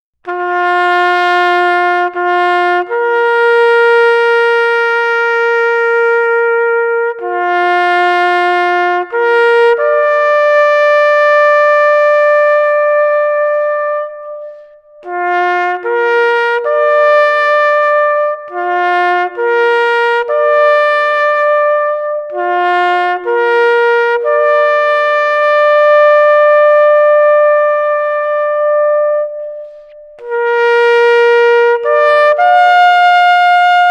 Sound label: Taps Bugle; CW Bugle